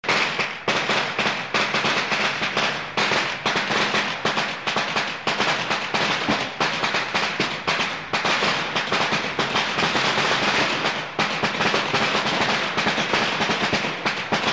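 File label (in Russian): китайский новый год фейерверк звуковой эффект звуковой эффект весенний фестиваль гала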